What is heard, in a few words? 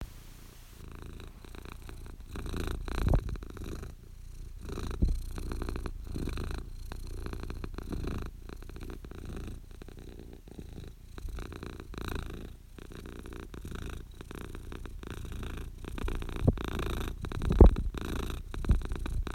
noisy cat